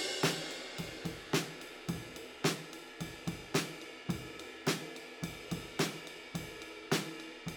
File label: Overheads